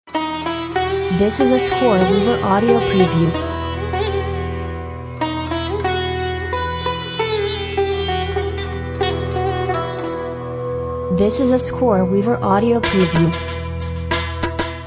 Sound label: Happy Sitar Dance; scoreweaver